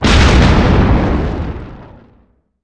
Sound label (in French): explosion; impact